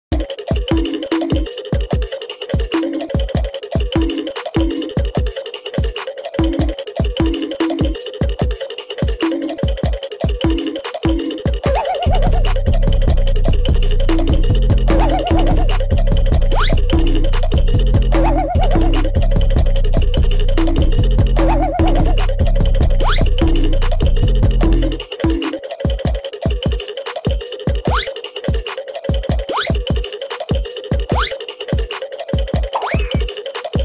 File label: Goofy beat; AVERAGE ALARM CLOCK IN OHIO